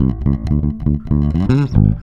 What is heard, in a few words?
REAL BASS, BASSLICKS; Ueberschall; Jam Box